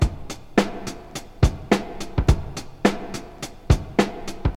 105 bpm high quality drum loop A key, kick tuned to the A note